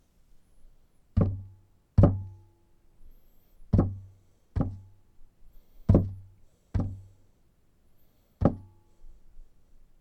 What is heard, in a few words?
Bumping Guitar Body